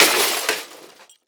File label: Crash Metal And Glass Debris Dropping On Pavement; Crashes